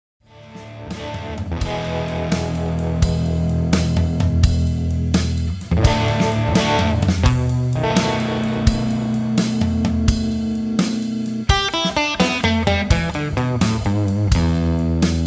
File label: No Bass